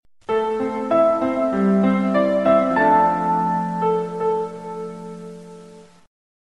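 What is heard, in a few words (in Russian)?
милое sms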